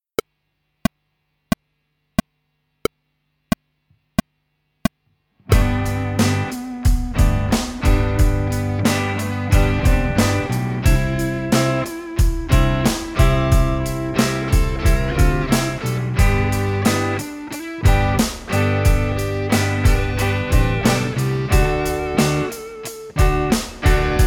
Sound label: With drums